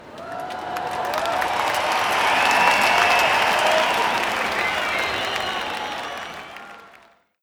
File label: crowd huge outro